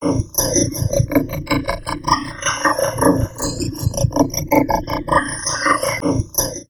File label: VOCOD, Drum Loops Crazy Processed; USB Soundscan vol.07; AKAI